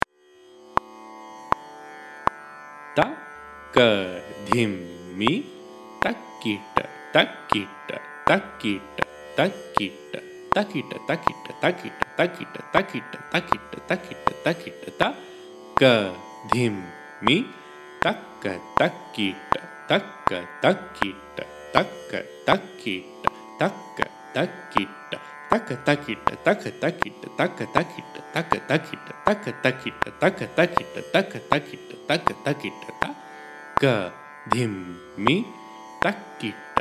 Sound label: Carnatic Violin Reading Skill; Grade 2; SaPa